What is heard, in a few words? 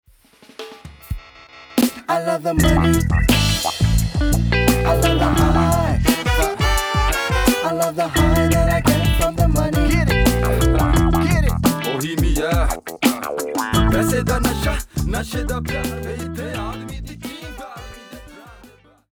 Funk Dub